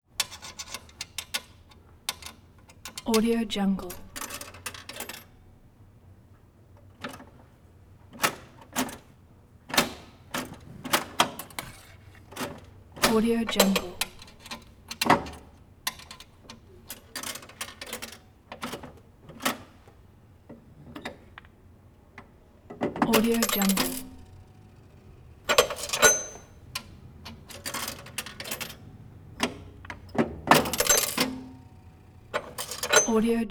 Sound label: payphone handling insert coin and return